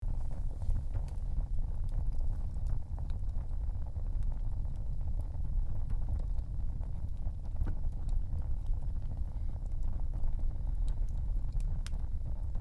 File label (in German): Ofen brennt; Geraeuschesammlung